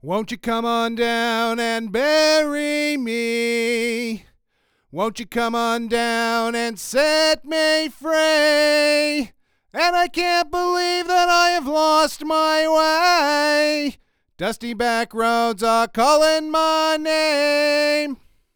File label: Apex C12 Mod Card Vocals; APEX 460 TO C12 DIY MOD